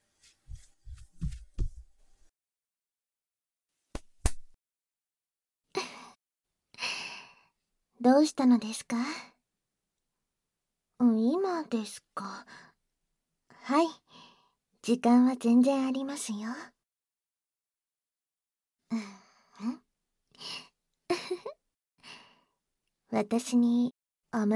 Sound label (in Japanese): 耳フェラでお耳のお掃除（バイノーラルマイク収録 効果音あり大きめ）, ASMR; 効果音大差分